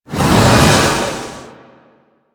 Swarm Tear Spawn; Warframe; Entrati

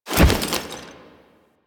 Foley Jump; Warframe; Kahl

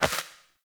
Sparrow Death State Impact; Animals

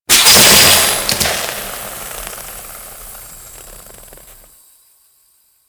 Death Gas Burst; Warframe; Grineer

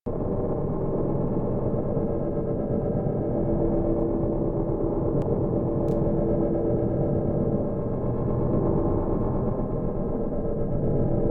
Archwing Room Hum; Warframe; Hub; Tenno Carrier Ship; Liset

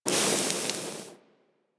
Fire Ball Charging Loop End; Warframe; Ember

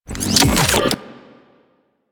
Briefcase Launcher Reload; Warframe; Weapons; Corpus

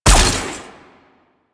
Weapon Fire; Warframe; Sentients; Dolicholyst

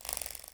Day Insect Close; Ambience; One Offs; Glades Of Andurin

